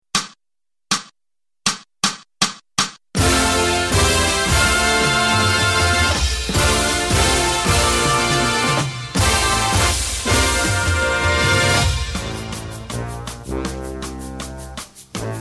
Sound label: w Clicks